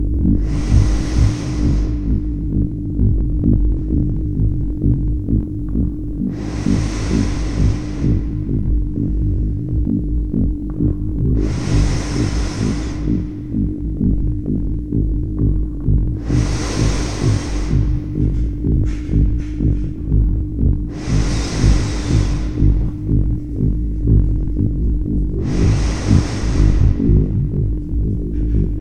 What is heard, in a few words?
landscape background